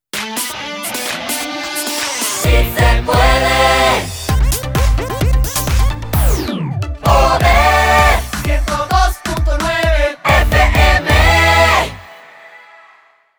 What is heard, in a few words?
POP JINGLE